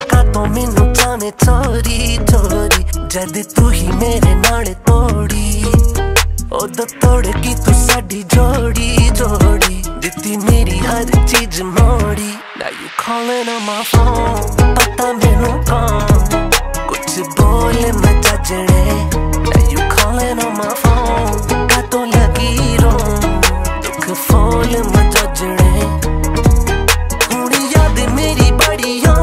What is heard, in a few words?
Calling Ringtone